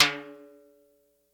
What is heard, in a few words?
Mid Timbale; Groove Elements